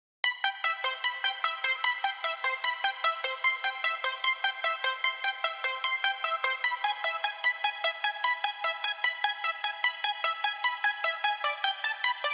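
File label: 150 BPM E Minor Arp, Future Bass; Cymatics; GHOST (Beta Pack); Destination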